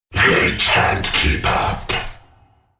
Droid spellcast effort

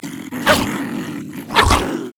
wolf angry; LoL; monsters

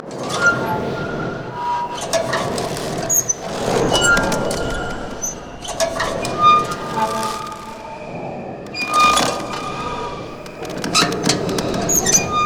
shop lantern; LoL; emitters map12

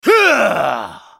spellcast effort; BlindMonk

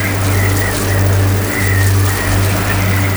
upgrade loop; LoL; heimerdinger

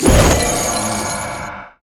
lantern; LoL; thresh

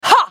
spell cast effort, en US; Caitlyn; Character Voices - English

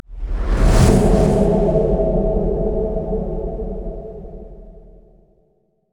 nexus powerup dwarves; LoL

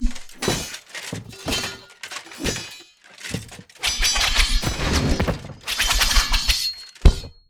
emote dance; LoL; jarvaniv

